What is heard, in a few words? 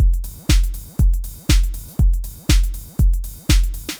BEAT, DANCE DRUMS; MAGIX